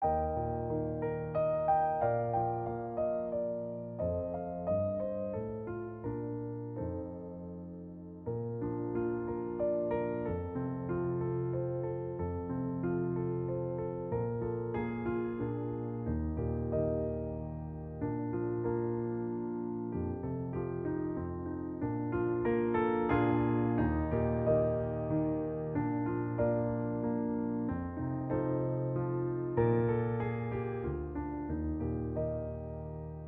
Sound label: accompaniment